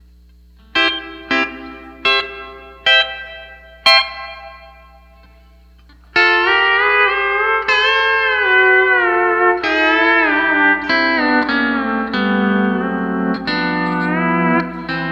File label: Plate; Hall Of Fame